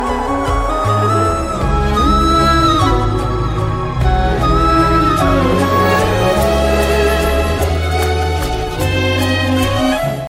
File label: music ringtone, bollywood